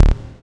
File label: SYN POLY, JD 800; Sound & Vision - Gigapack I CD 2; JD 800/990